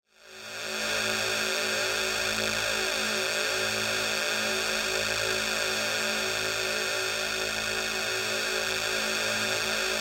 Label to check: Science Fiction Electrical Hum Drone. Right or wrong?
Right